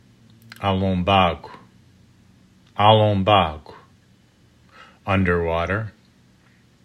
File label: underwater